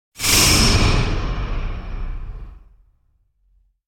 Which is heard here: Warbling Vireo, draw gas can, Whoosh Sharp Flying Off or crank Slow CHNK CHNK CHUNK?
Whoosh Sharp Flying Off